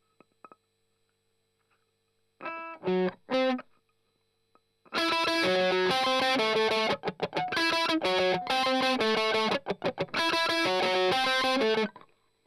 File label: Strum Octav